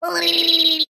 cartoon voice high pitched head shake or lip wobble